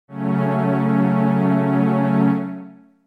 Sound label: Db Gb Minor